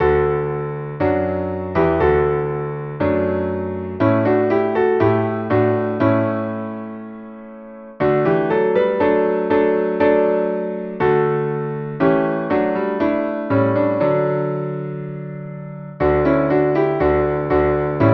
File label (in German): Klavier D Dur